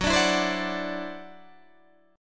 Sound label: E 7th Suspended 2nd Sharp 5th, F sharp